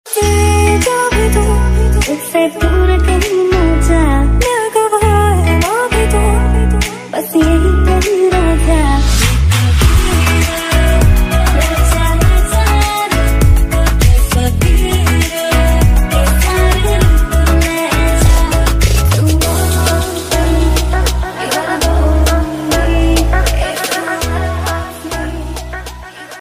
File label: remix ringtone, bollywood ringtones